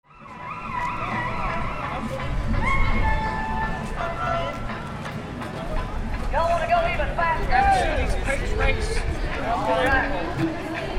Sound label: AMBIENCE